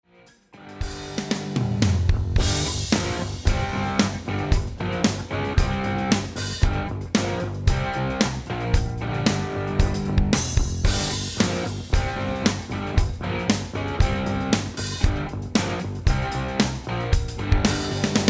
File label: very raw; surReal